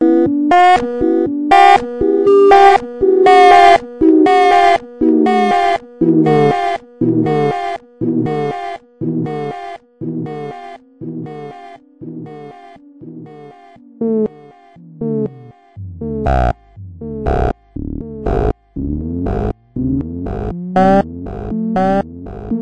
DECREASER; Studies in MASS

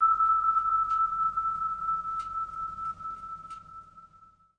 bell E; instruments single samples; bells

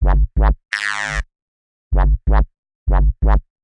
acid whup whup buzz; SYNTH AND ELECTRONIC LOOPS

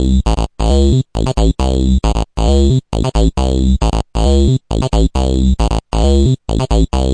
square crunchy bit, synth and electronic loops

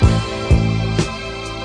jam hit, sampled music; melodic samples

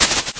Syn Dr, Overloaded Synthetic Drums; style kits

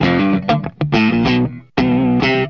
096 deep guitar; GUITAR LOOPS

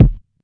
kick, Classic Hip Hop; STYLE KITS; Classic Hip Hop Mega Kit

